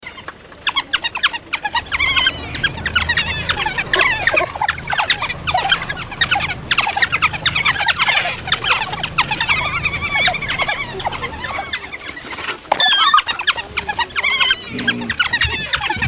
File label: Africal Wild Dog